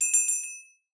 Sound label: tiny bell